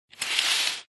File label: drapes Being Drawn Open or Close